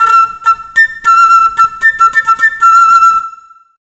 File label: Flute